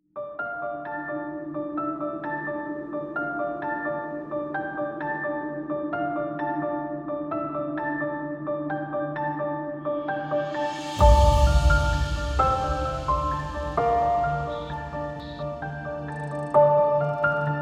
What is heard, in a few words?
cinematic melody